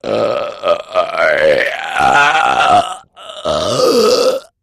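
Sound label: Large Triple Belch Mu, belching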